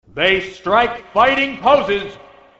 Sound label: making fighting poses